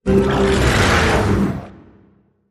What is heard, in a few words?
Monster Growl 2